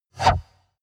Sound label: wipe